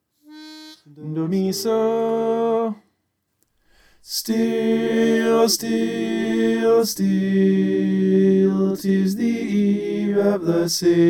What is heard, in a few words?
tenor